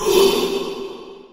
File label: ghost damaged; ghost 2; phasmophobia